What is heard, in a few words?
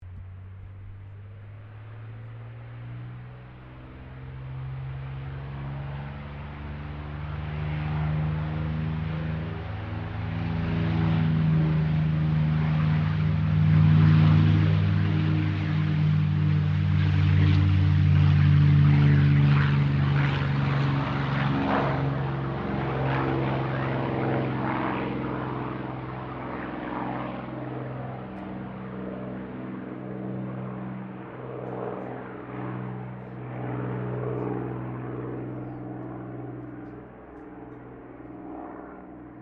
AIRCRAFT CAC CA-18 MUSTANG MK23 TAKEOFF; CA-18 /P-51 Mustang; Airplanes; Vehicles